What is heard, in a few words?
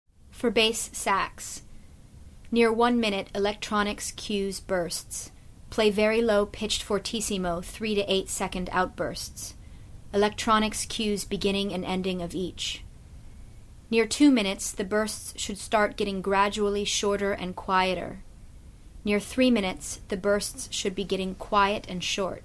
Bass Sax; EricRoth